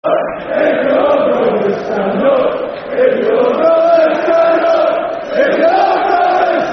Stand Up If You Love Rovers, Football Chant (Soccer Song); Doncaster Rovers Drfc